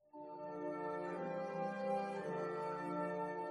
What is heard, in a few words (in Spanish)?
sonido Marcha sala